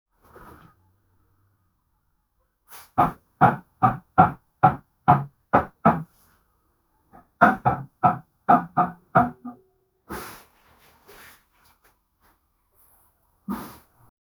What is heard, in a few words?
airport